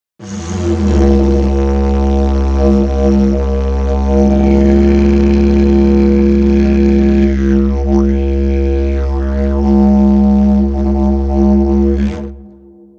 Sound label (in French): dijeridou